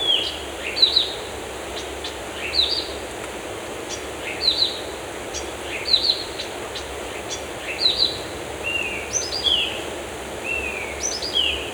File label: Birds in Forest; Loop; افکت صدا حیوانات; Animals